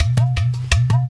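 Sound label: percussion udu; Roland LCDP14 Africa VOL-2; BTS Af.Prc.Loops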